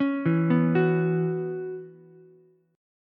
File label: C sharp Minor 11th C sharp, Ukulele C6; C#m11; arpeggiated; JGuitar